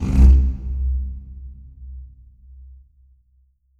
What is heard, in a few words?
Pipe Rub; Effects